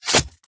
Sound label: Dagger Small Stab